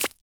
footsteps

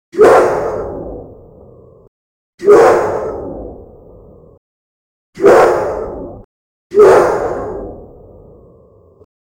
attack sound effect, wolf

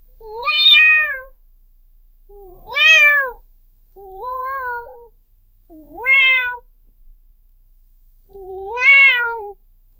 cute kitten meowing high; feline

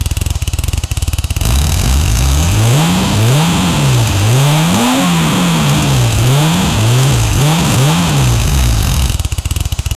steady direct drive two, kart

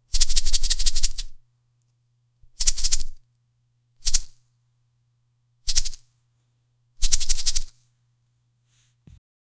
shake hand

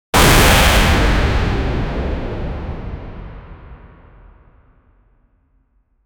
futuristic arena shooter

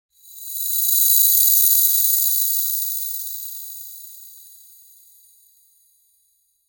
spark sounds, electricity; add spark sounds

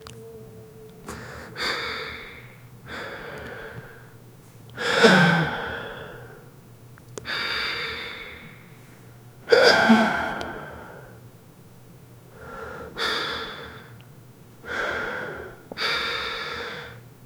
18 years old man holding, close mouth

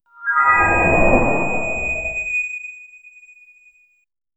smooth ascending sweep; snow crunch

